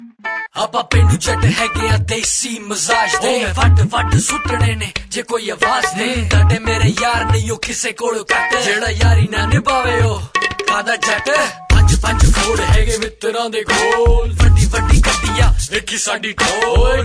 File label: Desi Rap